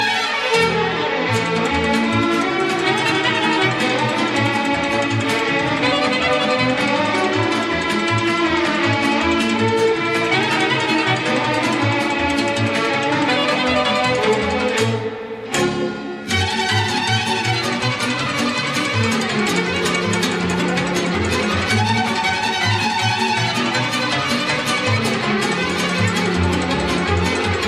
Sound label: Instrumental